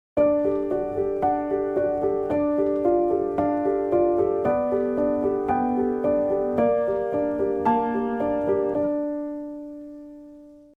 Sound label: Intro Piano DRY